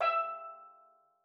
E4, STEELDRUMS; Xsample VOL-4

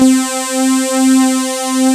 SAW SYNS; Pro-Rec - Synthsonic

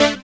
SYN TONE; Sound & Vision - Gigapack II

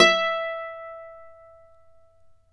Celtic Harp, Plucked Strings

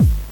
Techno Kick, NES DPCM

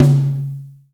NATURAL P, DRY+FAT KIT

